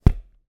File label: bicycle helmet down on ground; transport